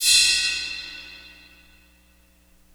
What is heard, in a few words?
IMP CYM CRASH, Cinematic Impact; M-Audio Pro Sessions; Liquid Cinema; Drum